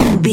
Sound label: retro impact hit general